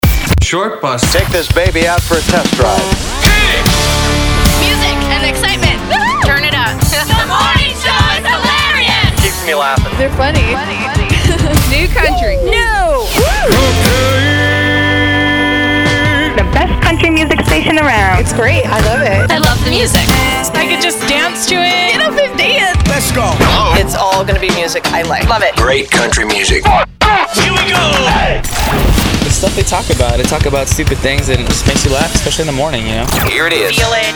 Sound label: Country